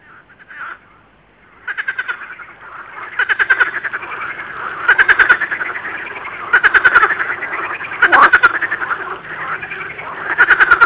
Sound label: ridibunda, waterfrogs